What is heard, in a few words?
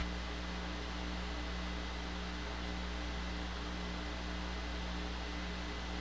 White Noise speaker